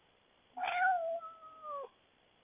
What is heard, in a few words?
cat meow